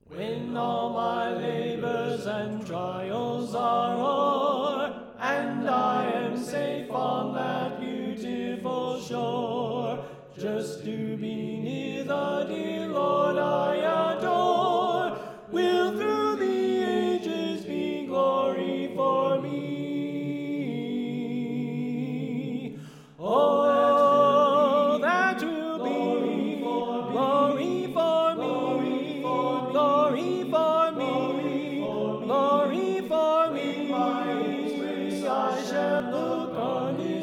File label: Alto Left